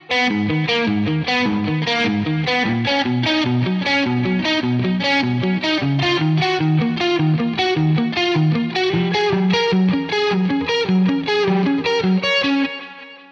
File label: triad ex tabs